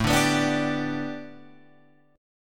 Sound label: A 7th Suspended 2nd Suspended 4th A Guitar Open F, chord; A7sus2sus4 strummed